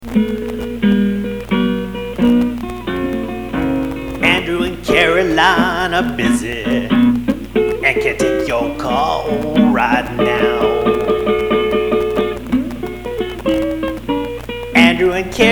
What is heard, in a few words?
answering machine blues